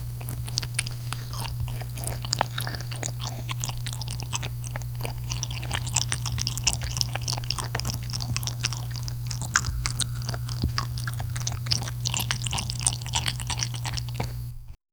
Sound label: eating computer sound eff